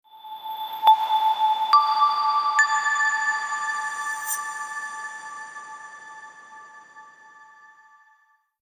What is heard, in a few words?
short logo